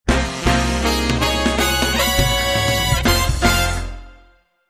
Shuffle Brass, Jingle

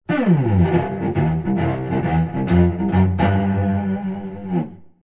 Cello Boogie

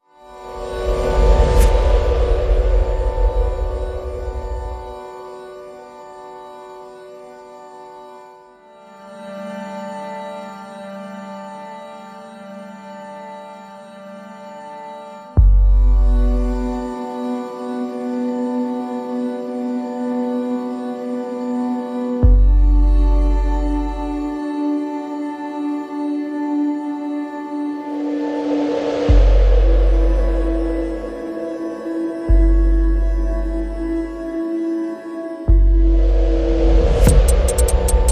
No Rhodes

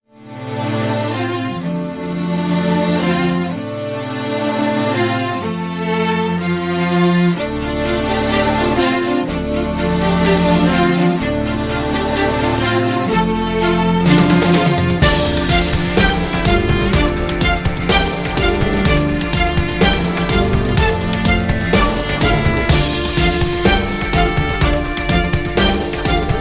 Strings

